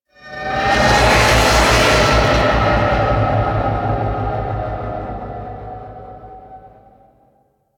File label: Fly By Slow; Nora Liset; Vehicles Flight